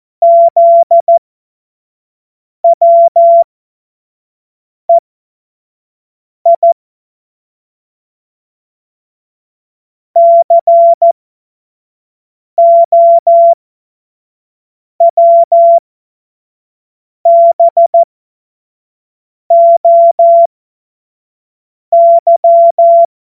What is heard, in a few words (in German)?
Witz, Morsekurs, 25 F70; Witze